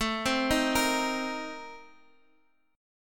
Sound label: Bb Major 7th Suspended 2nd Suspended 4th